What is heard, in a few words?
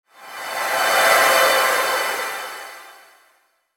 Whoosh Airy Flange Descend, Whoosh Soft Airy Tonal Production Element Imaging Element Accent; Part of Whooshes; Blastwave; Whoosh Sound Effects